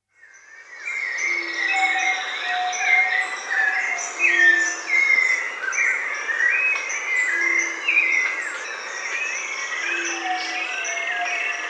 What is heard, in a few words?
Yellow Legged Tinamou Crypturellus Noctivagus